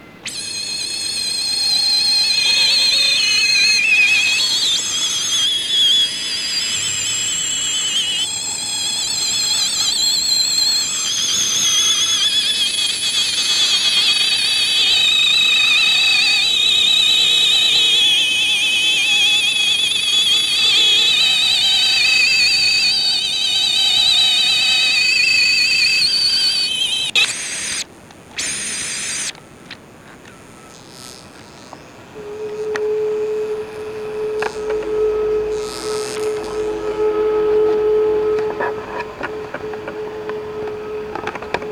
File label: winding